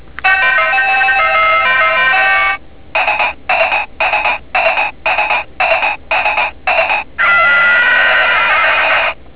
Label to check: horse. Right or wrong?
Right